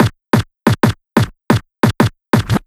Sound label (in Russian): Harder Scratch, звуковой эффект хип хоп